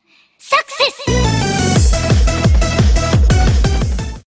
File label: success